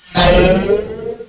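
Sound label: teleport; sw demo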